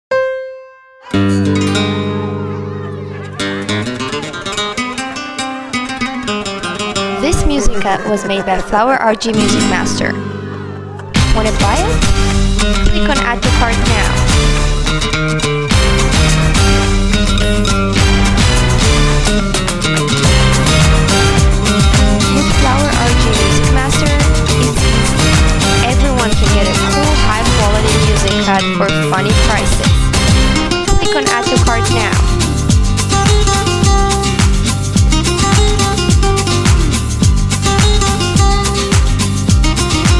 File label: Picante music rhythmic gymnastics